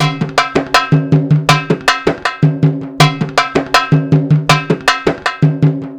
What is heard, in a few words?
PERC LP, Arabian Traditions